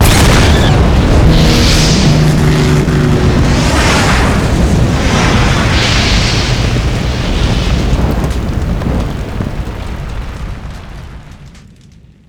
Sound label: Plane explode; mono